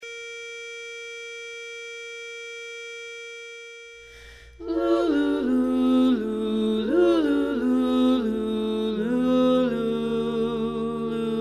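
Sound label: baritone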